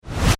Production Element Whoosh Reverse Fast; Whooshes; Sound Design

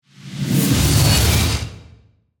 Whoosh Mechanical, Airy, Accent, Transition; Production Element, Imaging Element

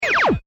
Laser Arcade Blaster Gun; science fiction sound effect; star wars / trek style games

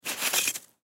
Ice Pick Pick Up Blade Scrape; Weather; Ice Sound Effects; Part of Ice (Sound Effects:Weather); Blastwave

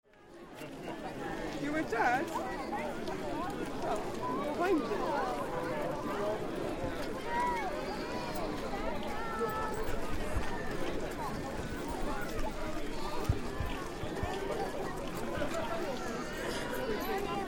Old Country Market No Modern Sounds; Tom Hutchings

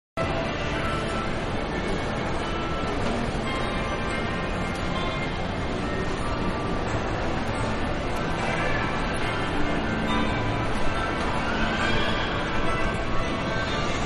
Shopping Mall Ambience Walking About; Stores And Mall; Manhattan Production Music

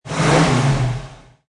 Mustang 68 Fastback Rev Engine Perspective, Car

